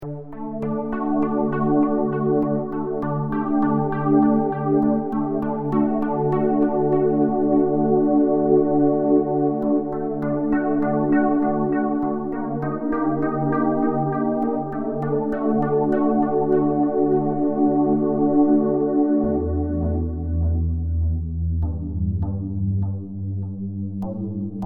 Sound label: background